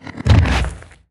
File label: e knight death; Akeron